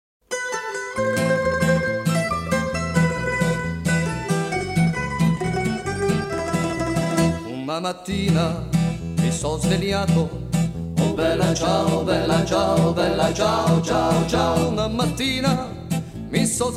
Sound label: Radio Music; Jurassic World Evolution 2; PS4; Windows; Xbox One; Xbox Series X/S